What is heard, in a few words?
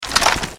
gun out; MK9; Jax